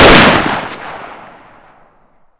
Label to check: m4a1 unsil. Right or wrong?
Right